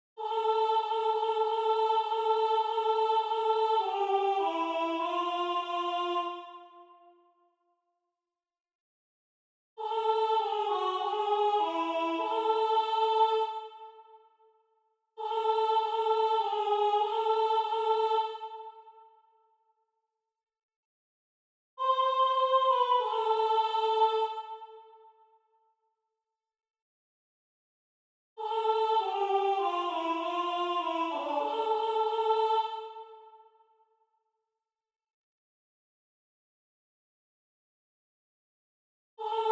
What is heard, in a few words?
MAGN Alto; Durante; Magnificat